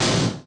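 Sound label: Collision Interior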